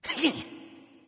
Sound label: Grunt; smite; loki